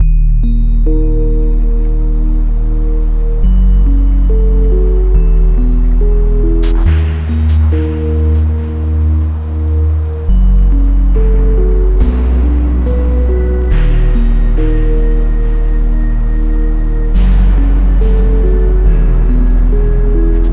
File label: Spooky Spooky; Game Music